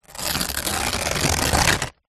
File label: paper bag small rip; foley